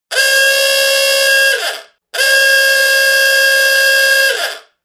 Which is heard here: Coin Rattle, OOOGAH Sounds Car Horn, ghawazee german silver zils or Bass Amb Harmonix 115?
OOOGAH Sounds Car Horn